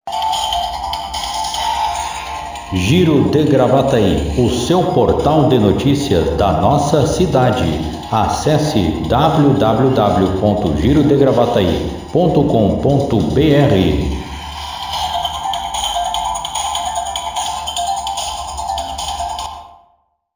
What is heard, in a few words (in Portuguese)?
vinheta